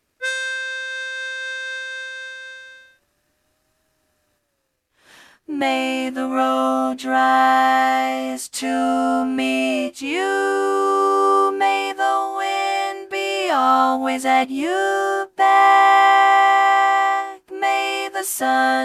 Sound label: Bass predominant; An Irish Blessing; learning tracks